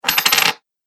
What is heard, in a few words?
Gold Coin, money; Money Sound Effects